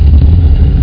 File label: grind; Duke Nukem 3D Kill-A-Ton Collection